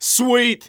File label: cheers; Scout